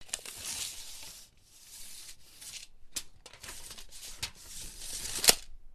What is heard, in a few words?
tape measure